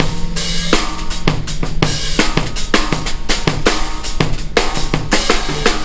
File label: Stone Room 82 bpm, breaks; Zero-G Beneath the Planet of the Breaks